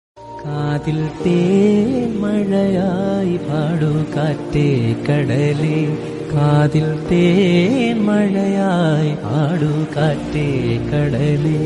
sad, malayalam

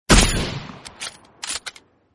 kar98 suppressed

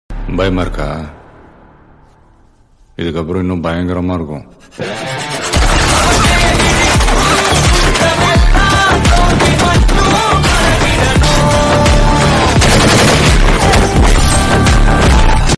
child death bgm; beast

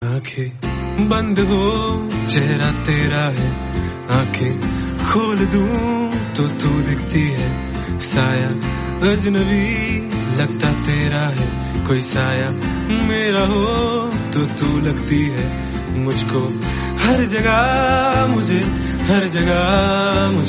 hindi song

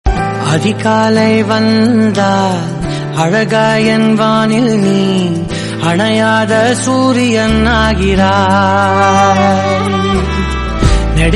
sad song tamil

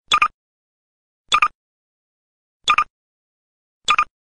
biper; half life 2

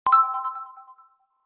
bgm; realme; realme original

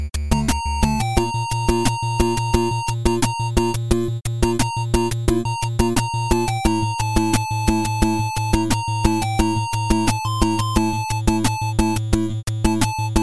8 bit

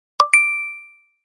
iphone messages notification

